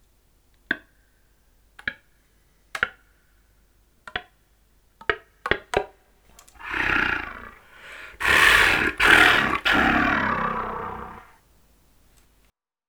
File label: clarinet sound